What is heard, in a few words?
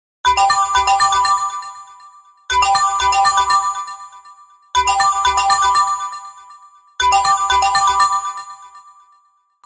Default tone, Ringtones